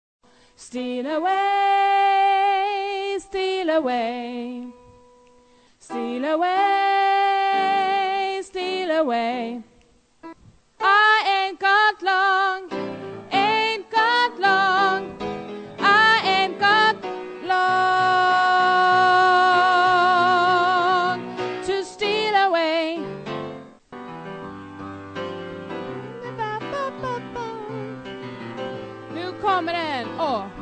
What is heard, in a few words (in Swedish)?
alt, stammor